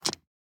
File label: DICE on Neoprene, Grab, Standard, 5 Five Dice; Samples; ArticulatedSounds; DICE Sfx Party (Extended)